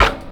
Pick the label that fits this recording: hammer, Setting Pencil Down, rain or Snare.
Snare